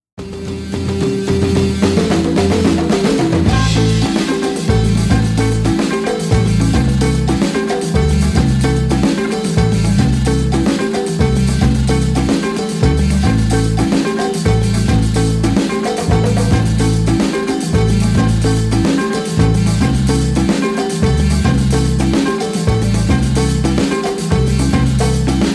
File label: Brass